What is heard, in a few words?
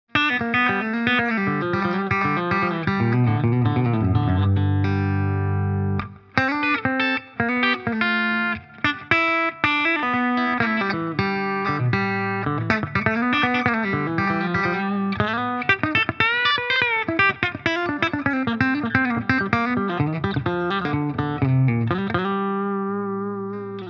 Honey Comp Country Comp Dry; Carl Martin; 12 h.